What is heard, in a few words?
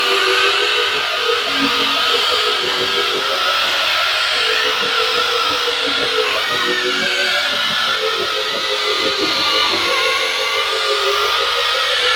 Banshee Missile Homing Loop; Evolve; Elder Kraken